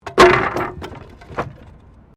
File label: Wood Metal Move; Material components for constructing more complex sounds; Materials